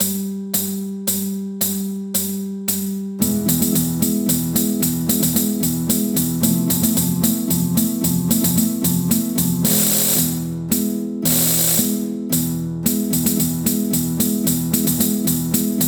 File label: Equestrian Dance; MTWFC VOL2 Parts1&2 Audio Accompaniments